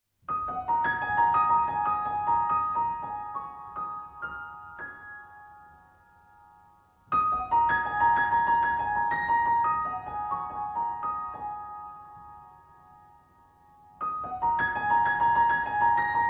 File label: PIANO